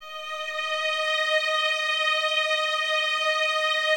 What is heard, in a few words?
D#5, String Ensembles Violins; Miroslav Vitous; 11 Violins